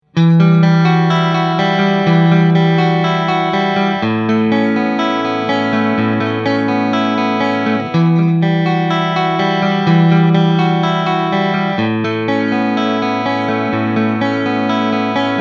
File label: Clean Mid